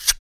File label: KUK, MALE PERC